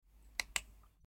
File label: tap sound